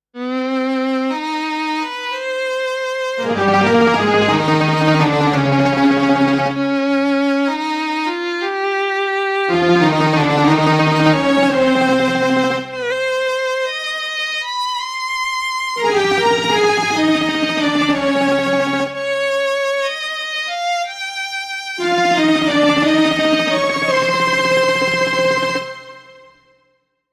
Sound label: Orch; Pa2x